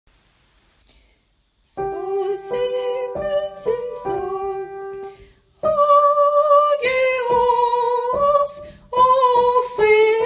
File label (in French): Saint Dieu grec Tenor